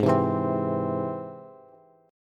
Ab Augmented Ab Bass; Ab+ strummed; JGuitar

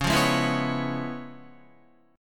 Db 7th Sharp 9th Db down Guitar; Db7#9 strummed; JGuitar

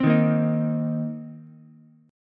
C Minor 7th C Ukulele; Cm7 strummed; JGuitar

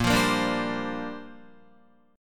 A sharp 6th Add 9th, Guitar; A#6add9 strummed

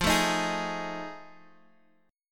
F 7th Sharp 9th F; strummed; chord; F7#9; 10,9,11,11; JGuitar

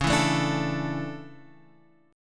D Major 7th Suspended 2nd

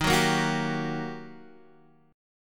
G Minor Major 7th Sharp 5th, D sharp

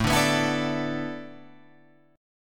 A Suspended 2nd Suspended 4th, Guitar, Open F; Asus2sus4 strummed

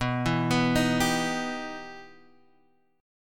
E Minor Major 7th B

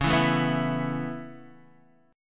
Db Minor 7th Sharp 5th, Guitar, Open D

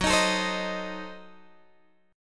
Gb Diminished 7th, Guitar, Drop D; chordshape; down

